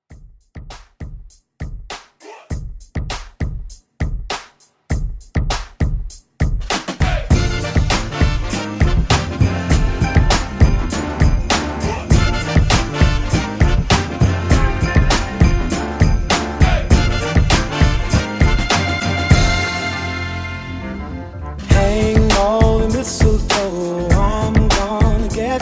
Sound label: Christmas Re Drum, 100